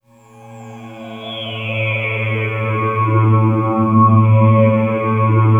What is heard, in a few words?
DOWN GLYDING, SF EFFECTS; Q Up Arts; Dream Experience